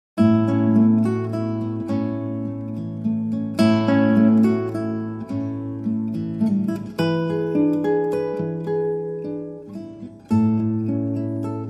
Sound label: Solo Guitar